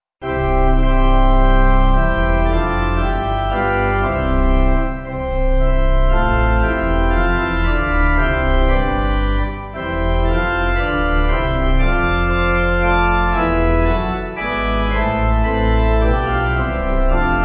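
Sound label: Pipe organ, church music backing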